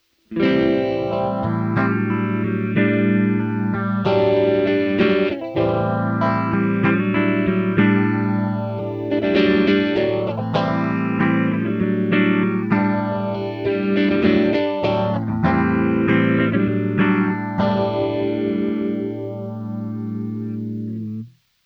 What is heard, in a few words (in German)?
Orange Gitarren Effektpedale Phaser Low Speed